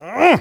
taunt exert

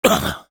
pain sharp, fr; Douleur aiguë 6

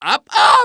flip exert; Medic taunt

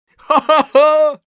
laugh happy; Scout; ru

